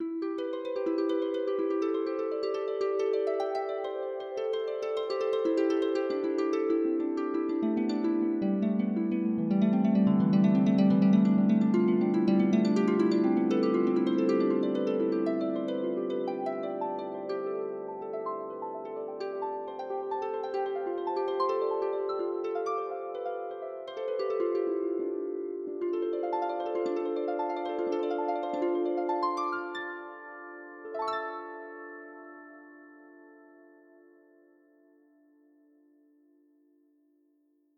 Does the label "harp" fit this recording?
Yes